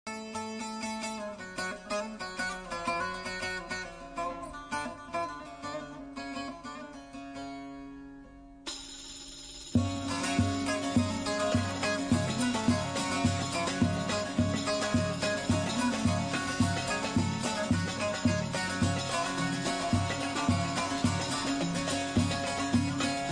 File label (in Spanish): TURQUÍA música